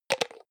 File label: hygiene small cup close medium; haus-garten; badezimmer